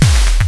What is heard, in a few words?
pan impact world; tron mod; weapons